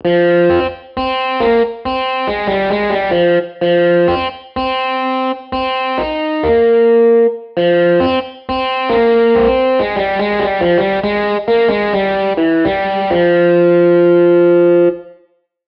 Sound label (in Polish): Gitara elektryczna